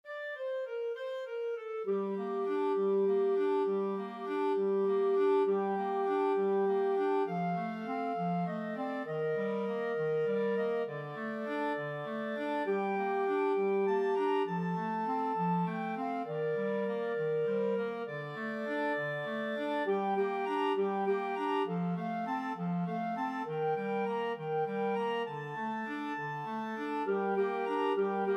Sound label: spanish violin, clarinet quartet